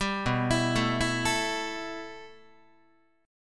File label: B 7th Suspended 4th Sharp 5th B down Guitar; B7sus4#5 arpeggiated; 4A,4E,5A,5D,5G#,6C#; chord shape; 10,7,7,7,8,8; JGuitar